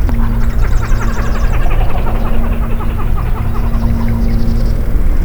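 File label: Pied-billed grebe hyena; National Marsh Bird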